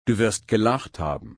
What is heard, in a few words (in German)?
lachen